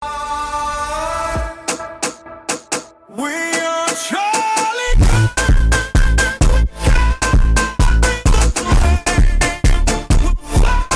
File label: phonk remix; charlie kirk